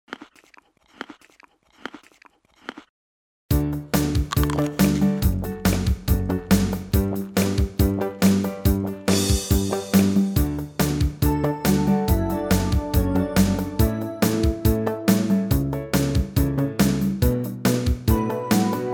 I like apples karaoke

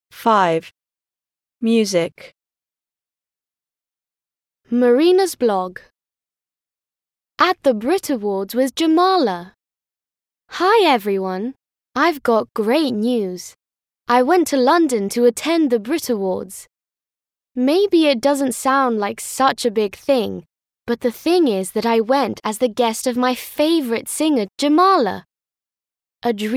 music; ukraine culture clips

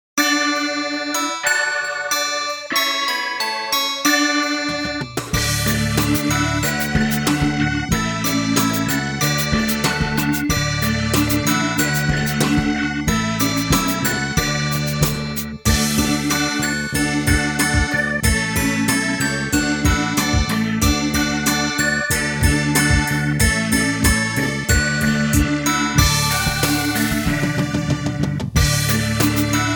karaoke

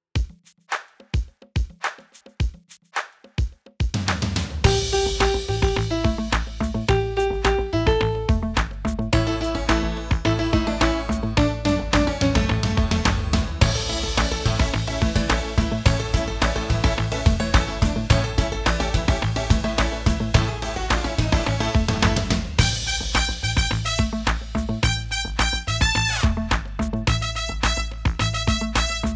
I can play karaoke

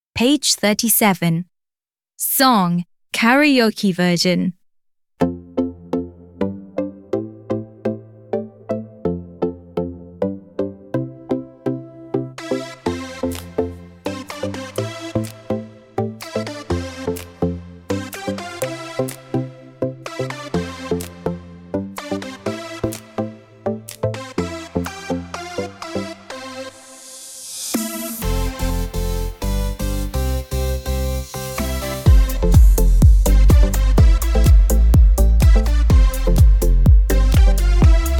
song karaoke

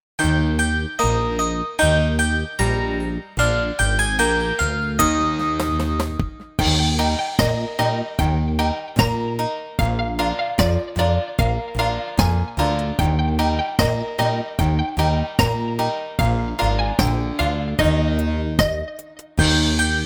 pencils in my schoolbag karaoke

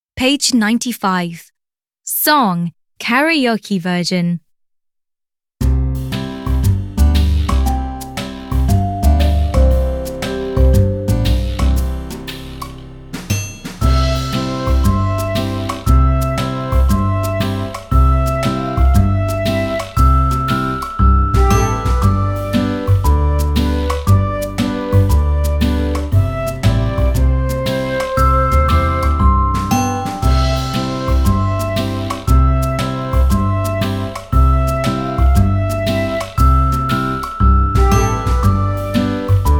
song karaoke; happytoons; happytoons edycja polska; szkola podstawowa

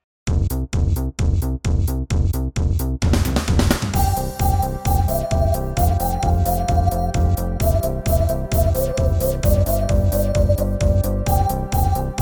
red yellow karaoke

